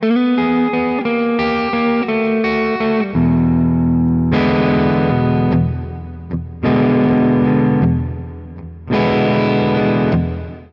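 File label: overdrive bridge, guitar; Guild Surfliner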